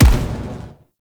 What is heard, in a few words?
Energy Rifle Split Explosion; Warframe; Venus; Venus Grenadier Spaceman Agent